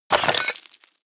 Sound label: debris pottery, bullet impact debris